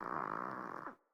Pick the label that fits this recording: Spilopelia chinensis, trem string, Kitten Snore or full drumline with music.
Kitten Snore